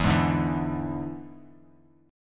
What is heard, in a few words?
E 7th E down; E7 strummed; JGuitar